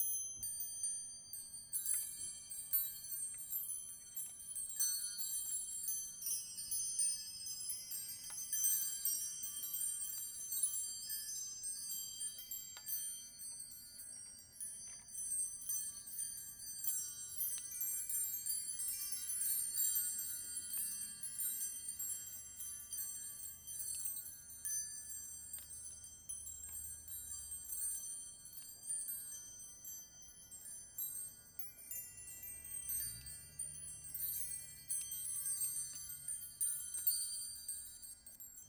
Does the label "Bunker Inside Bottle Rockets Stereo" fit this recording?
No